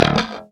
db2 staccato, glowly bass

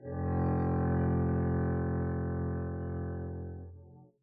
arco f1 p down, double bass

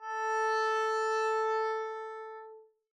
arco a4 mf up, double bass

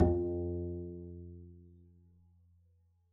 pizz f2, double bass

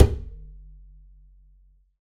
perc, pizz; meatbass

